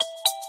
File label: xiaomi redmi poco x4 pro 5g notification ringtone